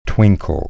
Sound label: twinkle